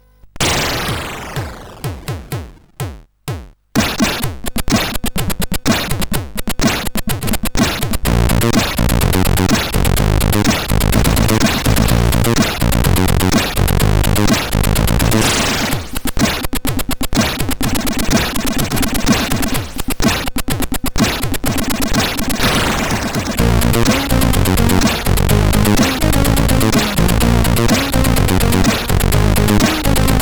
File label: Magic Funk CSG8580R5; SoedeSoft